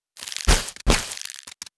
Attack Horn; Mars Sortie

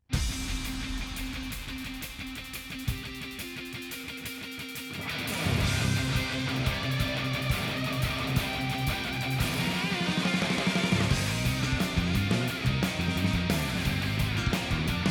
Battle Arena

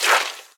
Skeleton Attack

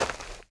Footsteps Dirt

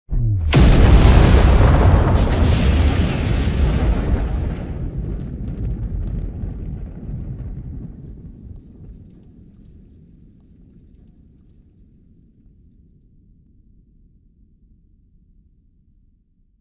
Crater Tower Explosion

